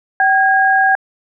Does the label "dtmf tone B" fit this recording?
Yes